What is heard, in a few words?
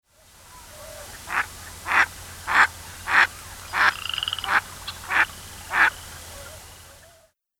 Mareca strepera, Water Birds; Chordata